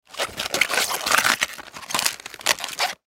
Food Cabbage Move, Foley Food Cabbage Move Open Stress Vegetable; Household; Blastwave